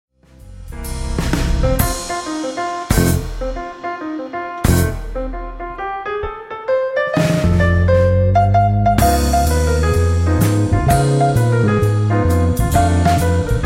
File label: pink blues, jazzband